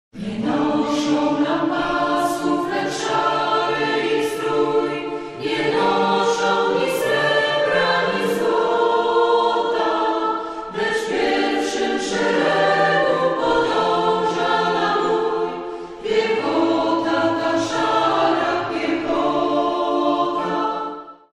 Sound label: acapp